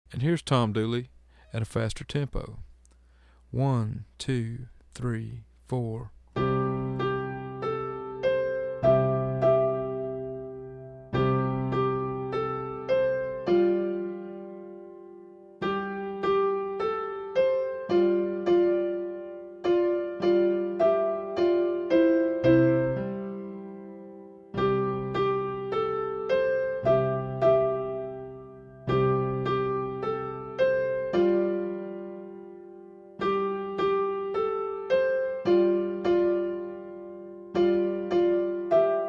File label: Tom Dooley Fast